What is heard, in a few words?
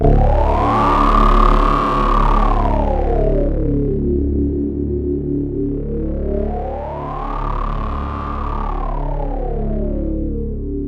FX SWEEP